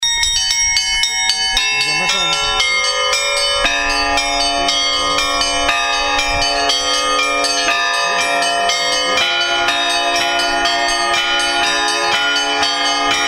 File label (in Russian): звон